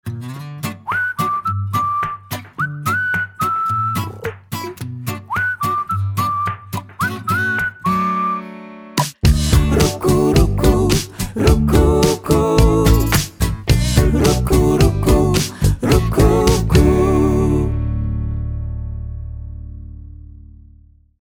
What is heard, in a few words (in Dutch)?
Jingle lang